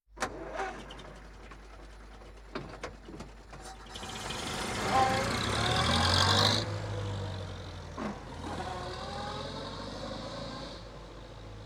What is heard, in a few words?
Car Vintage Start Depart; The 39 Steps